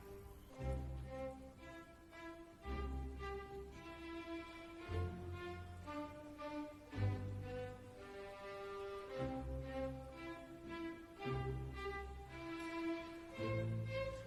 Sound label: andante